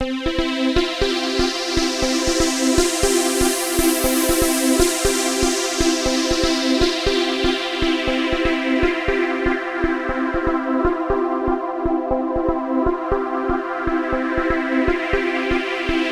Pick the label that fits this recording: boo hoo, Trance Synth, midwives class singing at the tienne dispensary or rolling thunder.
Trance Synth